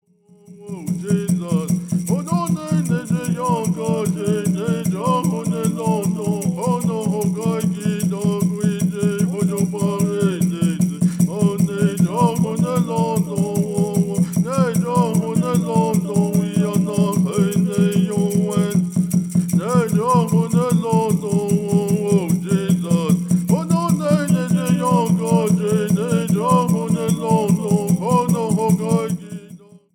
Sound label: Peyote Song